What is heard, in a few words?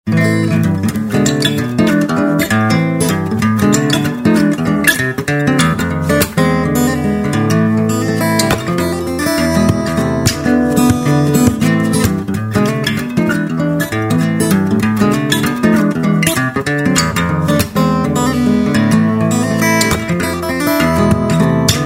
midnight jazz